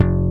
BASSES; Kid Nepro - Elite

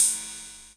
KR-33 Crash, Korg

